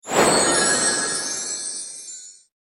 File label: open